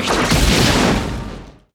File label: EXPLOS; Best Service; Advanced Mega Effects